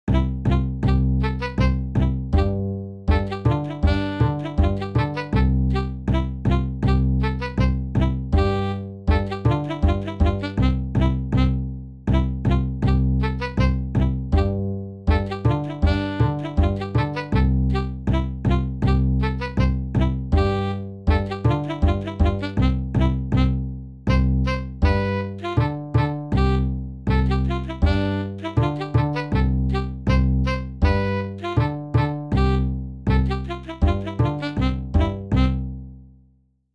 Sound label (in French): scottish valse; gabriel